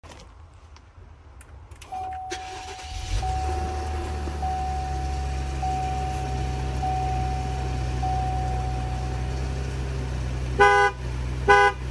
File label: fiam cadillac horn; فیام کادیلاکی